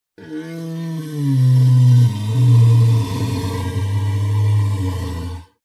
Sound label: monster inhale low; kreaturen